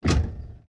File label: car gear shift